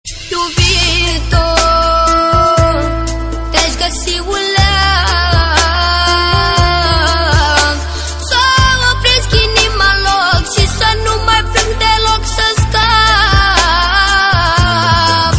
romanian song

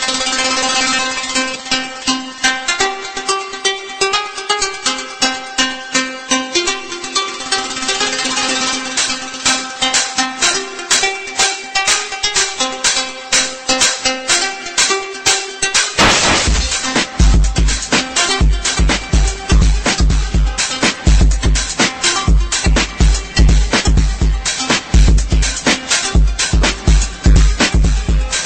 chinese beat ringtone

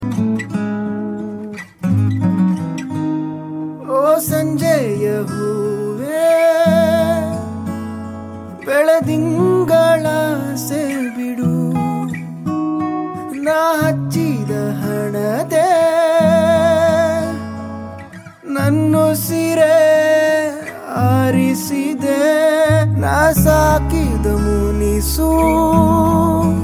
acoustic version ringtone

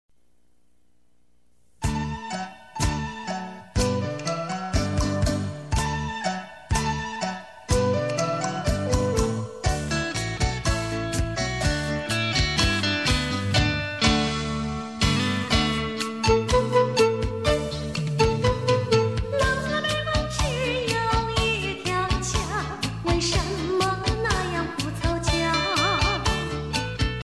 music taiwan ringtone

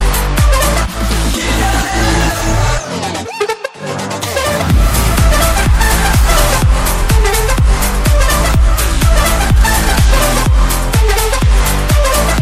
taiwan ringtone

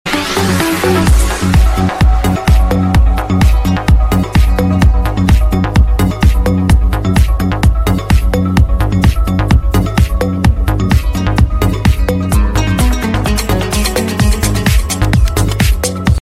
arabic classy

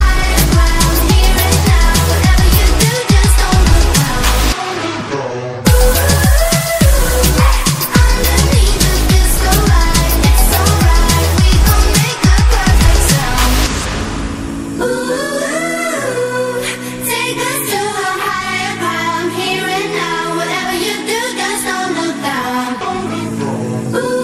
danish ringtone

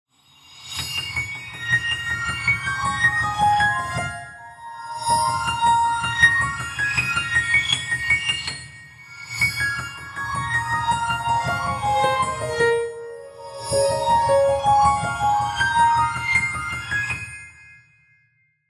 abstract, ringtone

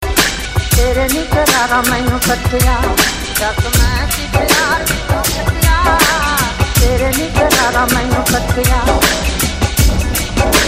punjabi mix ringtone

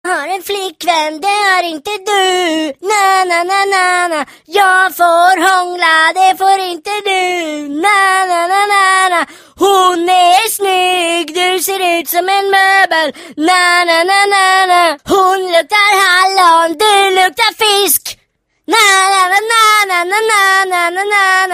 swedish nanana ringtone

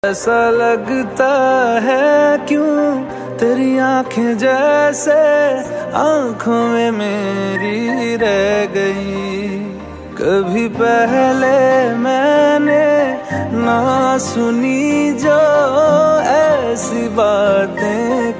romantic love song

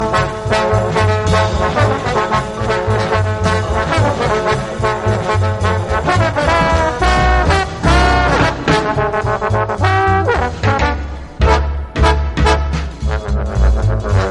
christmas music

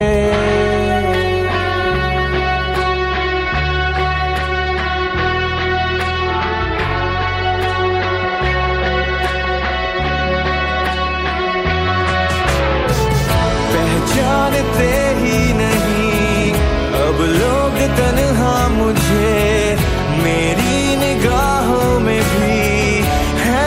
maldives romantic ringtone

